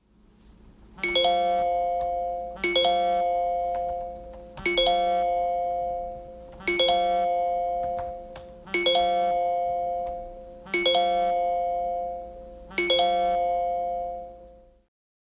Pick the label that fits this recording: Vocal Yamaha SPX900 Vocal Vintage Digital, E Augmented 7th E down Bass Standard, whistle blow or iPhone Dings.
iPhone Dings